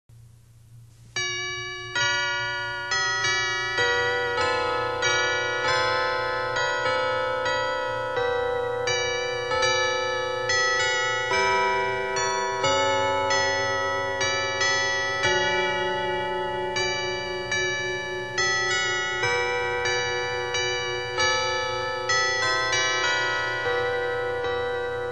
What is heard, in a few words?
carillon chimes